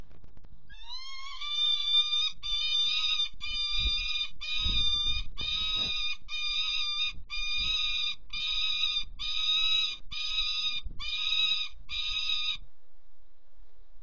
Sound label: PEFA screeching